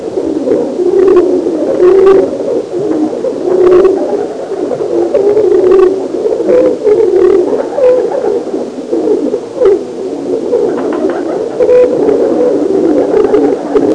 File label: Pigeons